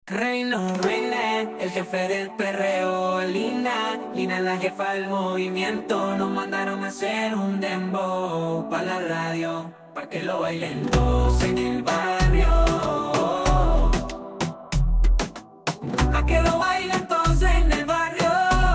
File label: Dembow